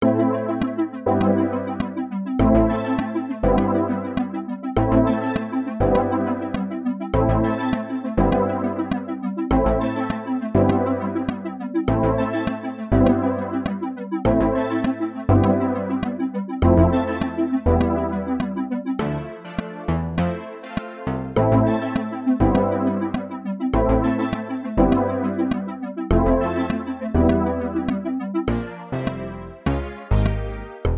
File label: Brass